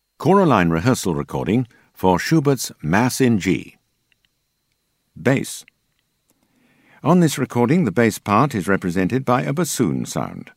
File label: Bass; Schubert Mass G